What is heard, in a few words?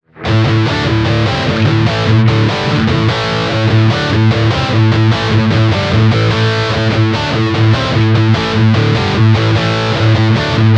blackstar ht metal 60 od2 british direct